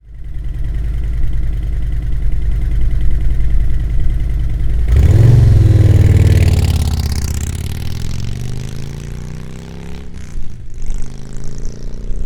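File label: Touring 107 Slimline 4 Take Off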